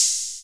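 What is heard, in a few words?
open hihat, Yamaha MR10; drum-machines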